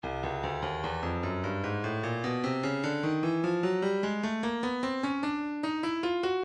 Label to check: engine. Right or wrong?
Wrong